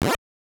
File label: enemy hit; metroid